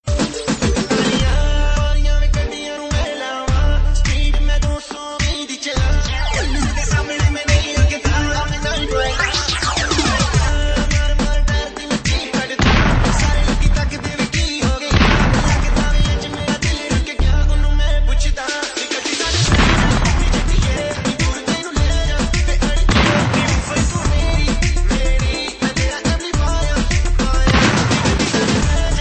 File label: Remixx